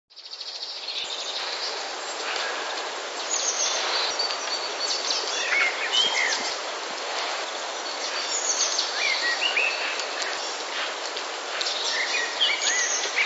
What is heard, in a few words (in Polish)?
kos, kosa